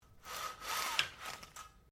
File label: Blinds Venetian Adjust, Household blinds; Living Room